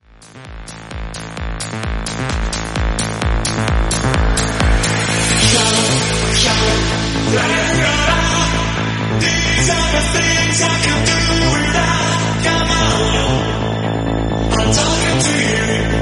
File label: 130 bpm Am